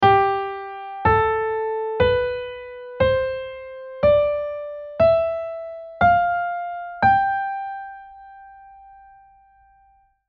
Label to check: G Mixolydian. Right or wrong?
Right